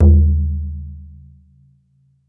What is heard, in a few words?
BODHRAN